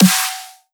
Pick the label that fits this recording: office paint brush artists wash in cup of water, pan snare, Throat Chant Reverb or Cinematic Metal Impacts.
pan snare